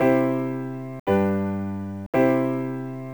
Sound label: Bonus Game Jingle; Yuuyu no Quiz de Go Go; Taito F2 System; arcade